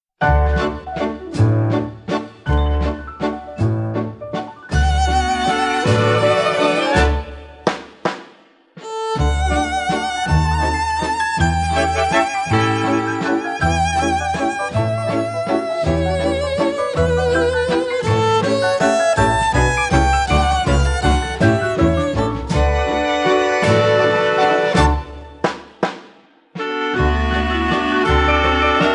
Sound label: Waltz; św Bernarda